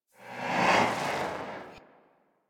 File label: Doom Sword Idle Whoosh; Warframe; Excalibur